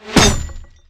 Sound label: Arrow Metal, Damage